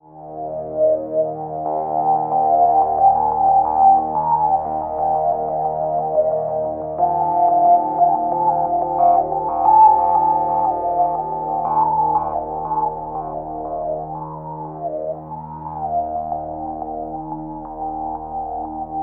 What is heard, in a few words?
Elektron Analog Heat Singing Filter